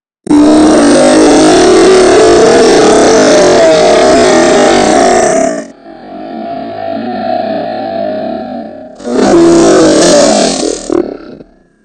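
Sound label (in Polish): rychanie monstra, monster roar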